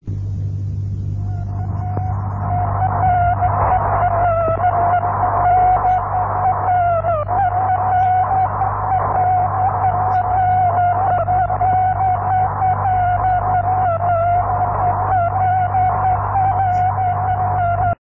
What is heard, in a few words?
Sputnik 3 Mayak